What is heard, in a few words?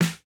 snare, snare house